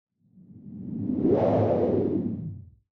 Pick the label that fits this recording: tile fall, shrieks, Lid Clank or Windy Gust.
Windy Gust